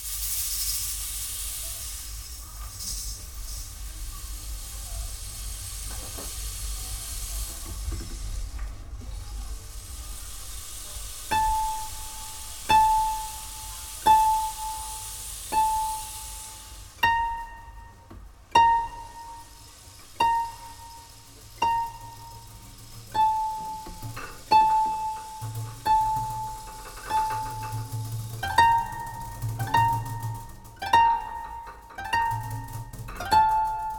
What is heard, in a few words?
RAIN